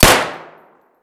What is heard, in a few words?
pb indoor close; Escape from Tarkov